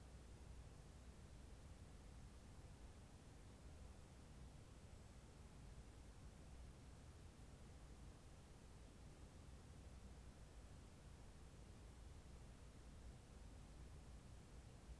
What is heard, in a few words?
H145 Background Noise right, ec145